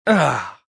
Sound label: Grunt Relief, Male grunt, relief; Groaning; Voice Box